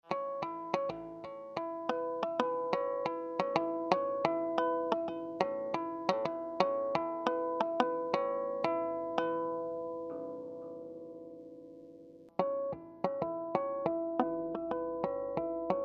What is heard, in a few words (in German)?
only High Boost div Kippschaltereinstellungen; Taurus